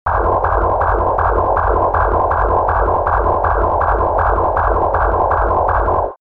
ufo

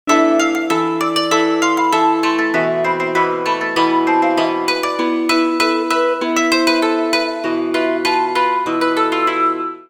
okoto saku

